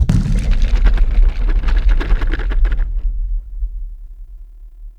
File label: Large Cave Quake; Duviri Murmur